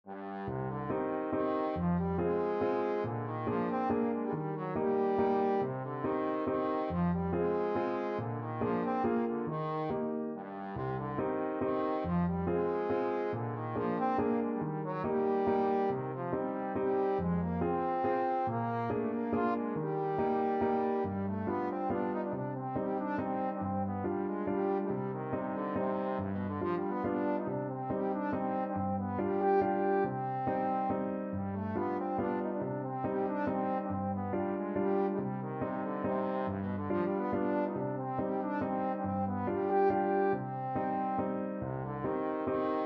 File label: trombone